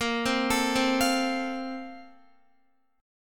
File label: Bb Major 7th Suspended 2nd, Guitar; BbM7sus2 arpeggiated; JGuitar